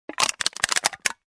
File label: Empty soda can crash